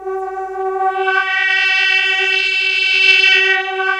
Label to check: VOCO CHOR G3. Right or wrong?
Right